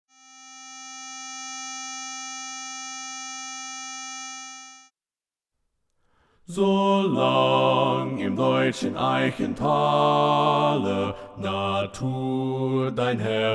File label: Bass Left; Junglingswonne; German